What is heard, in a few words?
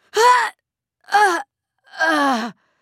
dying; Quinn